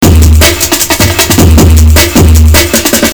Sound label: Loop